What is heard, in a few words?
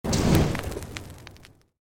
Created Magic Spell Fireball Impact; Series of created spell sounds